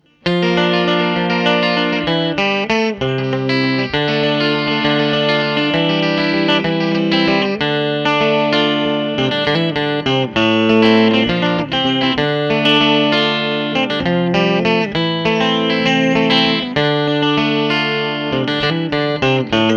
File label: Vox 710